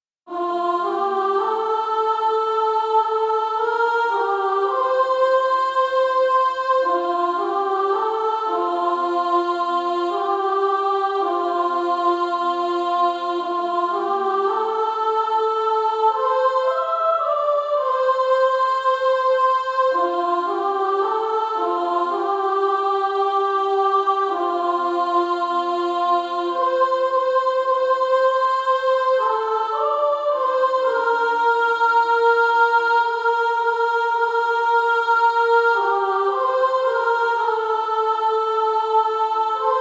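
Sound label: Female Choir, soprano 1; No Night There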